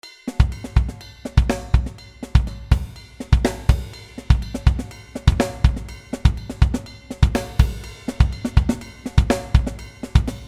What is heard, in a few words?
latin jazz rythme